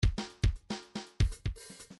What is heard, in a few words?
grooves funk